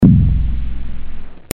House

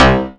electro, bass

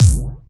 Bass Drum

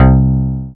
acoustic, bass

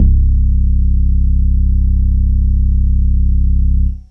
Bass

